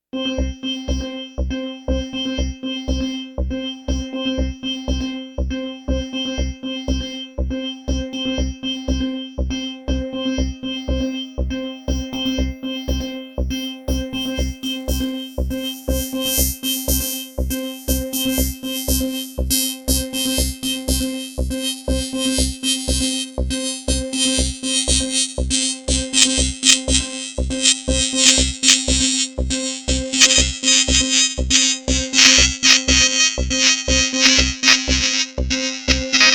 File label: Acidbox II Synthloop; Erica Synths